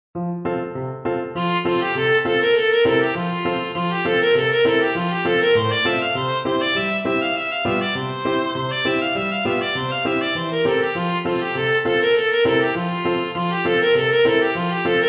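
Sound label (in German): schnelle finger viola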